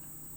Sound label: Hydrophone